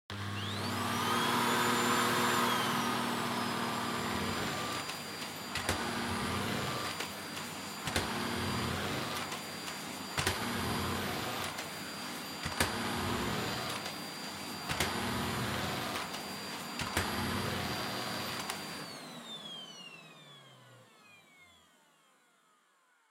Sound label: Vacuum Cleaner Vacuum Floor Wood Low